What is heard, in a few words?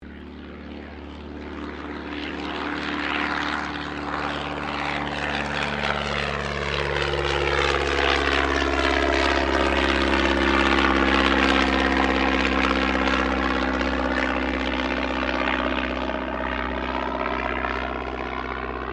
AIRCRAFT DH82A DEHAVILAND TIGERMOTH TAKEOFF; Airplanes